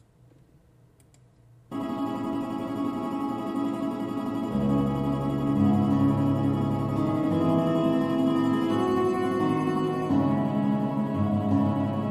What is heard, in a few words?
Baritone